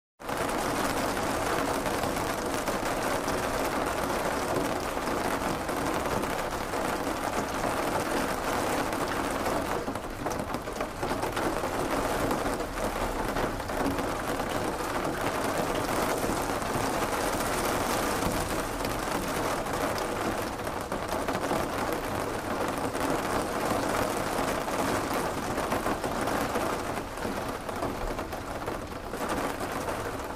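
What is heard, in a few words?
on glass and on the windowsill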